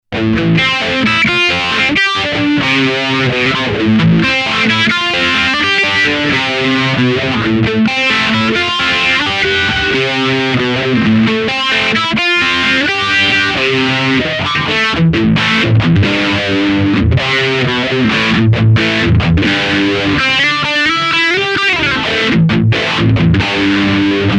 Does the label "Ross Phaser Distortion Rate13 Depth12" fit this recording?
Yes